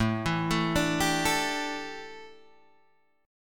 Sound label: A 7th Suspended 4th, chord; x,x,7,9,9,9,9,6